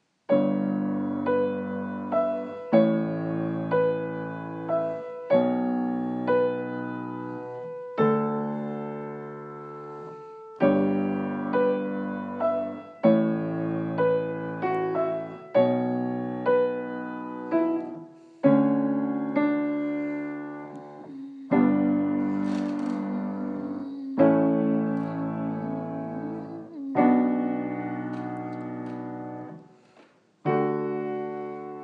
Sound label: piano